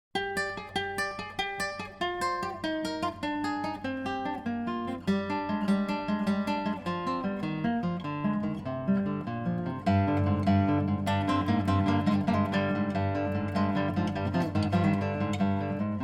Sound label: Guitar; Castelnuovo-Tedesco